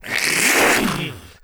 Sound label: groan, zombies